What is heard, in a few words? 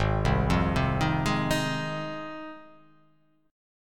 G sharp 6th G sharp down, chord